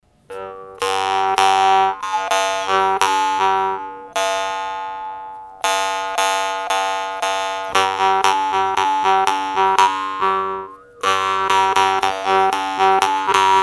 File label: g2 98,00 hz set, harp